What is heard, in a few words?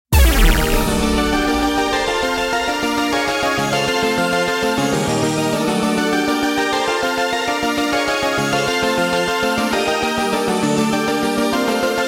BPM 200